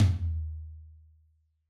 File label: Yamaha DJX 2B, Rock Tom